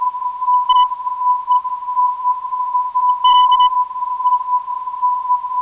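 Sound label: non-directional beacon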